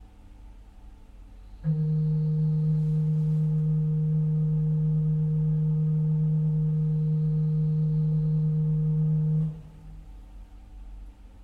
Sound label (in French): flute Ped, Orgue; une note de ce jeu; Pedalier; Ce petit orgue